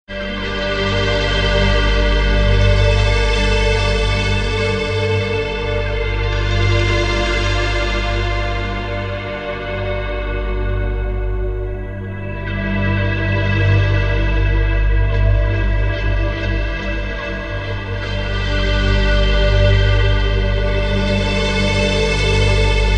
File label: Korg T1 Modulated String Pad